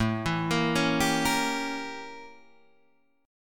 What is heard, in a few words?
A 7th Flat 9th Guitar Open D